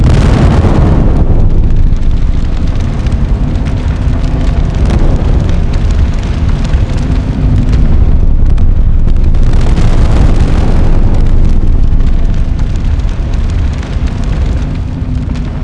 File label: volcano; The Rift